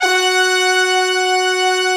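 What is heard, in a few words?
brass tutti, Brass Tutti octave; Optical Media International - Sonic Images Library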